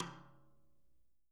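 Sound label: Living Drums, tom, stick; JZ XTOM 1; Sampleheads; Peter Erskine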